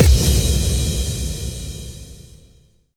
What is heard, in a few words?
Impacts